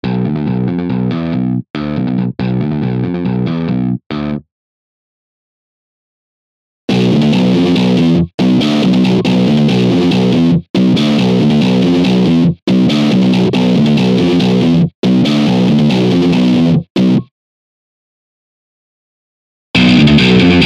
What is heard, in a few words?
Guitar Presets; IK Multimedia Mixbox